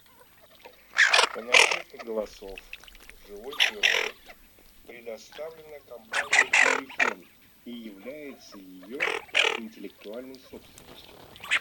Хохлатая чернеть Moretta

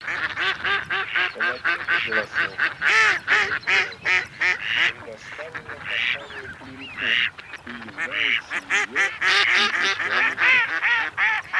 Кряква Germano

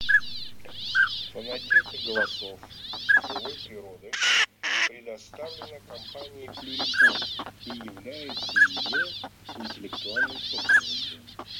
Шилохвость Codone; plurifon

